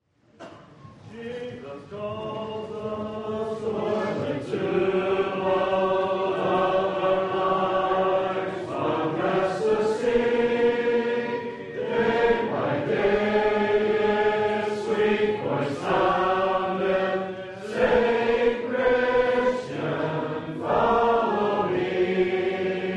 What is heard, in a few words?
Hymn, Gospel Hall; 2015Conference